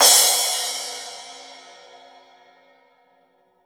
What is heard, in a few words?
Drums Acoustic, RAW KIT; USB Soundscan; AKAI